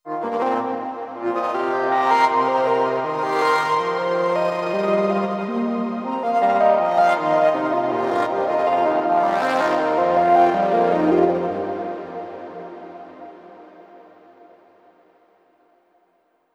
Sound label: Overlay Tone; Act3 Downtown